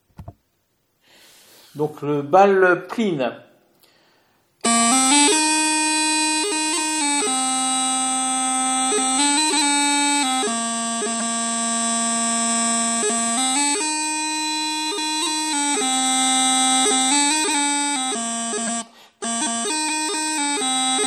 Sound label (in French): BAL PLINN